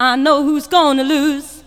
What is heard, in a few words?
VOX SUNG; AMG Megabass REMIX! VOL-2